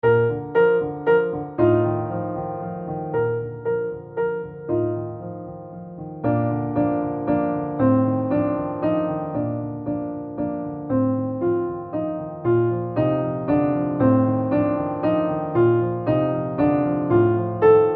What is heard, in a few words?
springtime waltz performance